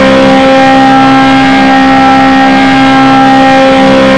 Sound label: v8; speed-dreams; cars; mpa11-murasama